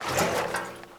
gas can inspect movement; tf2

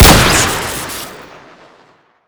shotgun incendiary shoot crit; tf2

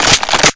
reload, weapons; tf2; skullbuster

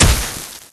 branding iron hit flesh; tf2

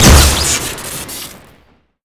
harpoon gun shoot crit; tf2

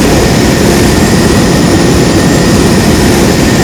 afterburner loop, weapons; tf2

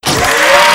heli gun wind up; tf2

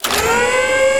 aa gun wind up, weapons; tf2

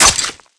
combat knife hit flesh; tf2

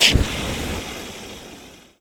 flame thrower pilot short; tf2

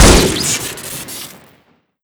assault rifle shoot; weapons; tf2; crit

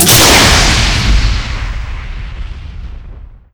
afterburner airblast rocket redirect, weapons; tf2

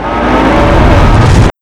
axe charge windup; weapons; tf2

